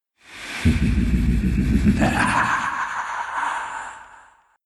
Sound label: laugh; Appa